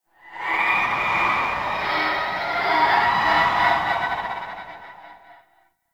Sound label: Citrine Void Connector Shriek; Warframe; Citrine's Last Wish